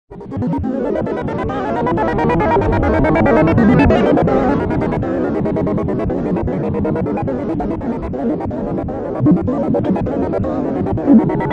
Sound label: Wacko Feedback